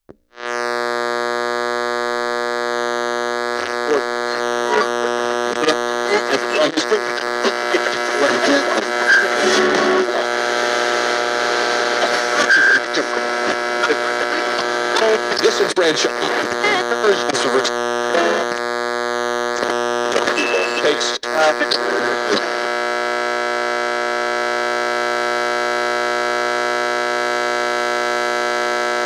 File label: Squelch; NAVTEX